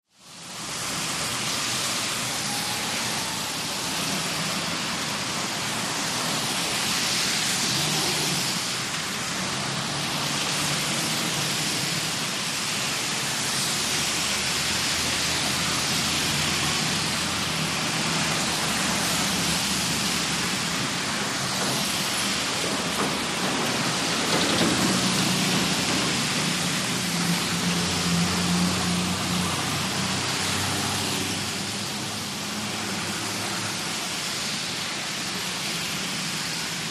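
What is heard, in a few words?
Heavy City Traffic Rain, wet traffic; TRAFFIC WET TRAFFIC: EXT B/G: Heavy street traffic in rain.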